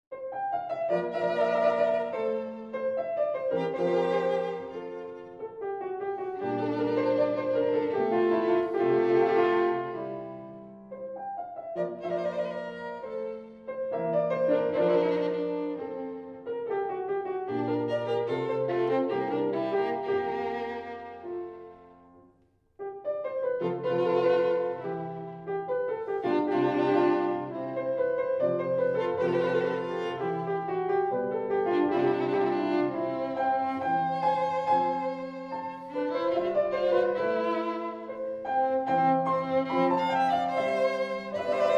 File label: Violin Sonata No. 3 in B Flat Major, Andante grazioso; K. 8